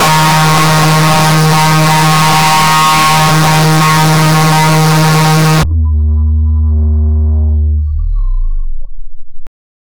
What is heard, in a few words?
bass boosted screaming